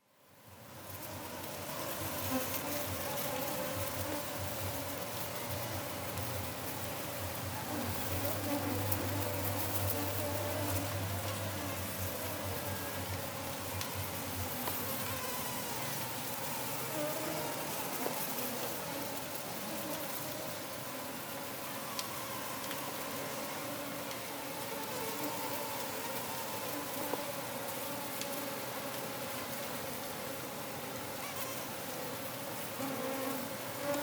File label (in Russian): Roi komarov